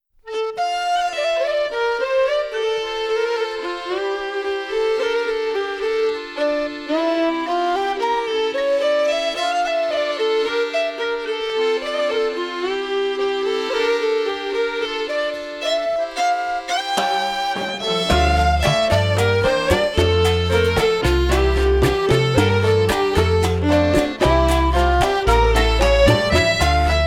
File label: Rumba Mambo Cha Cha Cha